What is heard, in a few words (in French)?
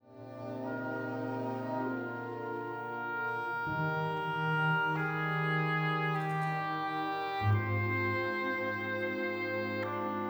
Canzonetta pour hautbois et cordes; Barber; op. 48 posthume